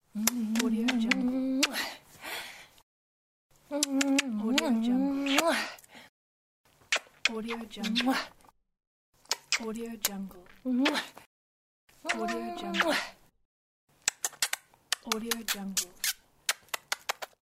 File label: women kiss pack